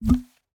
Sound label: paperlauncher paper gun; teardown ps5 windows xbox series xs gamerip 2022